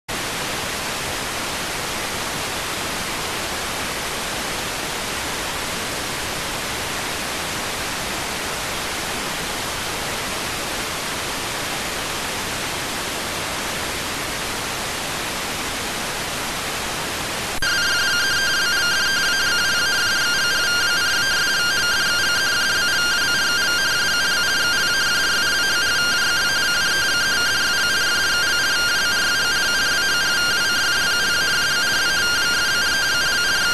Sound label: rtty rx